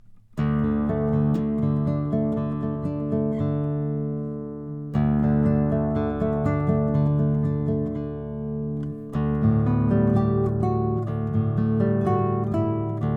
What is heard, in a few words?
lowden s50jazz african blackwood